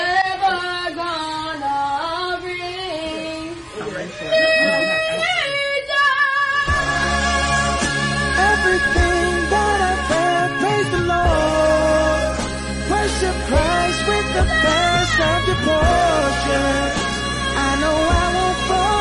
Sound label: ngaaaaaaaaaaaaaaa